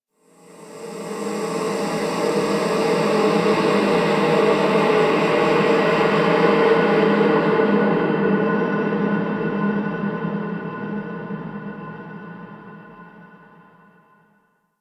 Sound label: Exterior Ominous Void Weave, Ambience; Warframe; Rail Jack